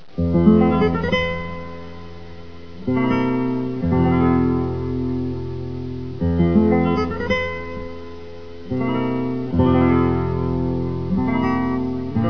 flamenco; ambient; Counter Strike Model Pack; CS:GO

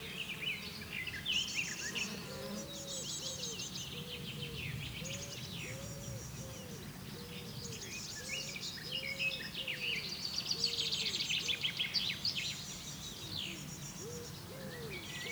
safehouse amb, nature; ambient

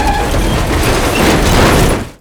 vehicle rollover; vehicles; v8; Half Life Alyx

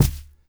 body fall, creatures, snark

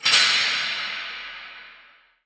metal

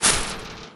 chainlink, footsteps; player

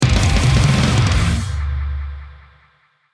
deathcam, music

